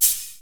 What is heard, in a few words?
808 MAR, 480L; Wizoo; Platinum 24 Electronic; 480L GA 808